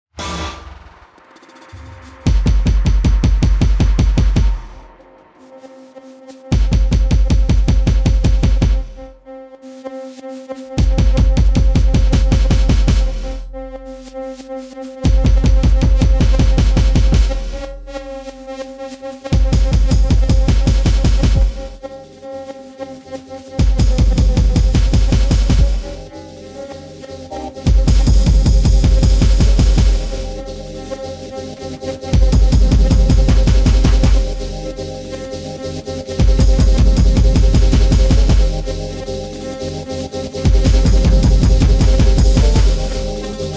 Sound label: Dumb Drums